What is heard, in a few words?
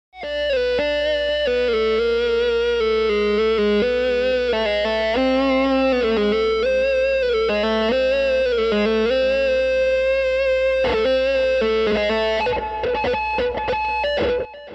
Guitar Fx